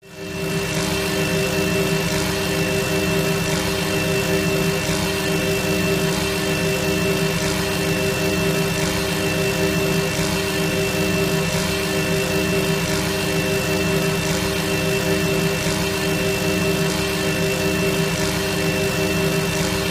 Engine Room1 ( Close Up Machinery ) Machinery, Engine, Room